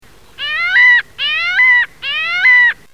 Stercorarius parasiticus

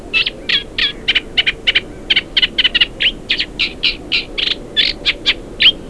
Acrocephalus scirpaceus

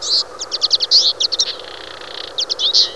Carduelis flavirostris

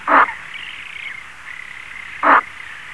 Platalea leucorodia

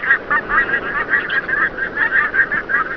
Anser brachyrhynchus

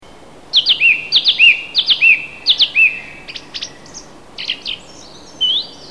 Turdus philomelos

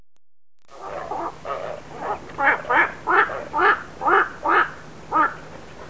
Nycticorax nycticorax

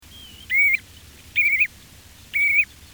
Charadrius hiaticula

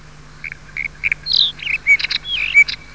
Oenanthe leucura

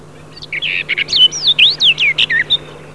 Sylvia nisoria

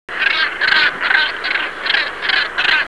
Sula bassana

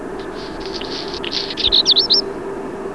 Phoenicurus ochruros

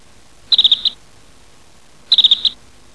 Calidris temminckii

